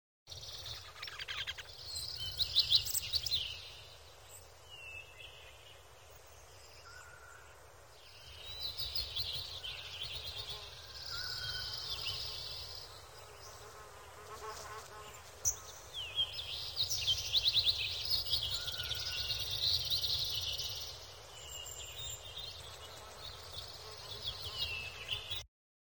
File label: ambientsound forest day; baldursgate